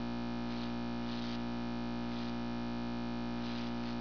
frigo; windhill

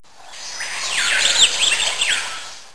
oiseau tropical; oasis

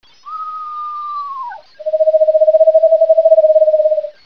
chouette

